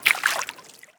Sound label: warrior run water